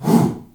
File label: HOO, SHOUT; Spectrasonics; Heart of Africa 1